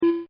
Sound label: BEEP PURE, Beeps; Objects